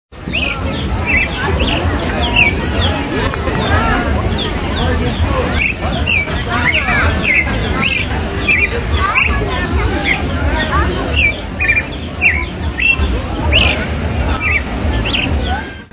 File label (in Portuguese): sons natureza